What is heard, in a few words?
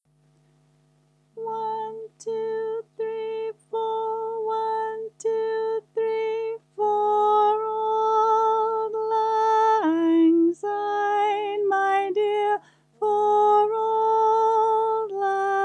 high chorus; Auld Lang Syne; Folk Choir music